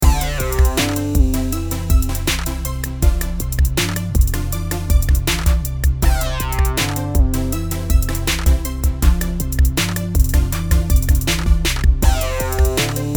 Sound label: hiphop